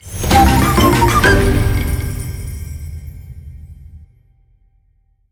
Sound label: winLarge; templeofnudges